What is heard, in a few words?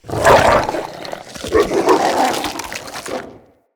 Wolf Summon Finisher; Animals